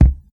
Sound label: Tight Low End Fusion Steel Kick Drum Sound C Key; 70s